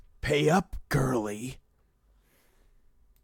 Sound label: game over